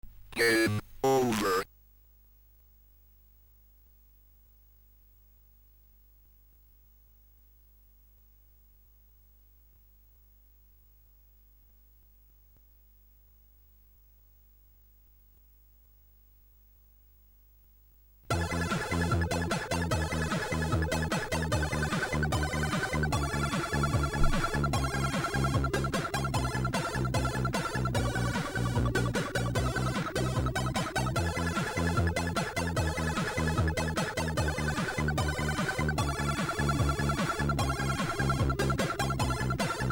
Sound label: music, commodore 64; Input 64 a collection of Commodore 64; turbo outrun